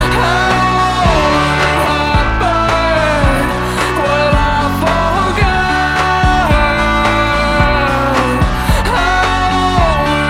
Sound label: Ringtone